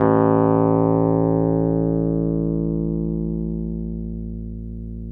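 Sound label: Wurlitzer electric piano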